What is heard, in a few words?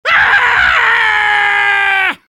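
soldier pain critical death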